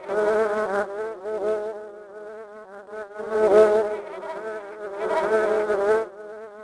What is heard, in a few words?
corpse flies